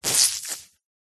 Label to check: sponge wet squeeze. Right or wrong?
Right